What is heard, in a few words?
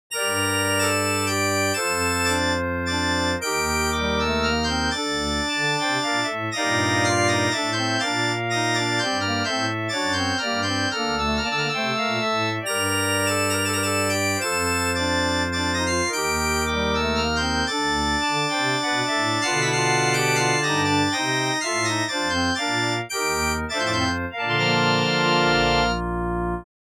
Church Organ E, Minuetto in g minor, dry; Andreas Instrument Sounds AB